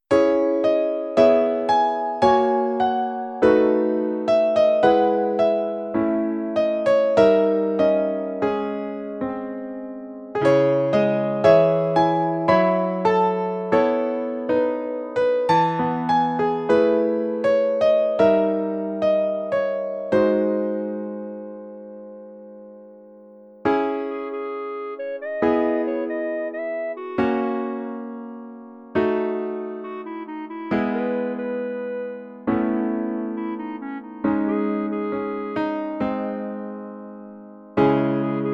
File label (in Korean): piano ver 멜로디MR -1키